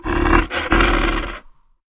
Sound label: crawler idle; shadows